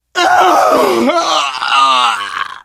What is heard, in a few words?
death scream, biker, survivor voice; source engine; left 4 dead 2